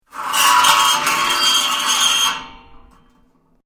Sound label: METAL SCREECH LONG LOUD; Metal (Sound Effects:Materials)